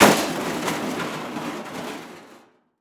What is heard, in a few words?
Crash Metal Rolling; crashes; AdobeCC